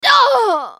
dying; Akali

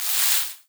brush on movements

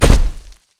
pounce land; nidalee base

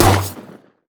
armsmaster empower cleave; LoL; jax

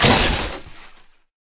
land; jinx base

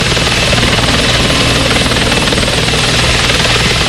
gangplank cannon; LoL; special forces gangplank

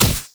human basic attack; Elise

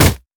mech melee basic attack; blue; minions

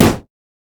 red minion mech basic attack; LoL

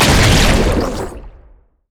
elastic slingshot; LoL; zac base

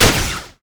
ranged attack; LoL; jayce

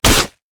pirate basic attack; LoL; gangplank

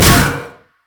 orbs missile; Diana

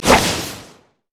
electrical surge attack; LoL; kennen

